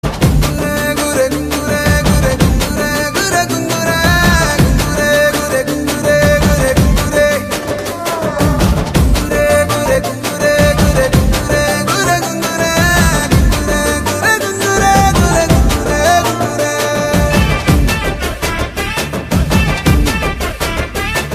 BGM Ringtone; Dhamaka Movie

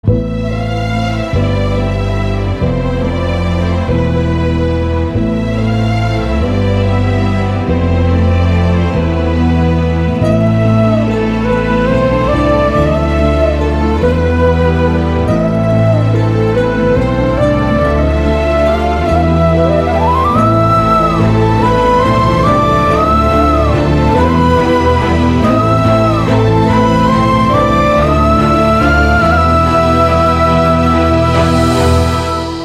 BGM Ringtone; Thiruchitrambalam

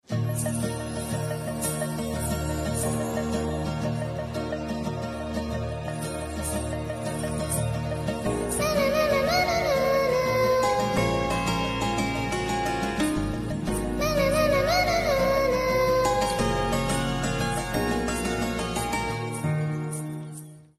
Sad Bgm

